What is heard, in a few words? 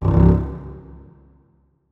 notes, keyboard strings; Hideout Furniture